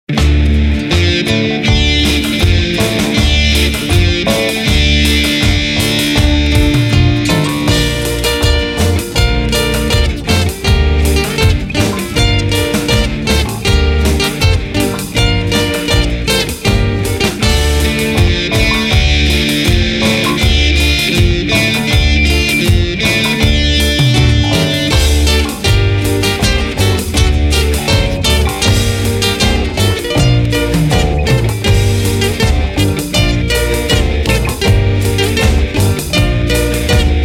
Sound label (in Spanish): carnaval de guaranda pista, pistas musica latinoamericana